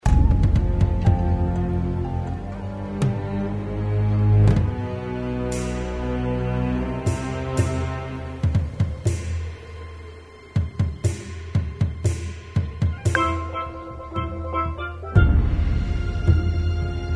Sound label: drummer game spoof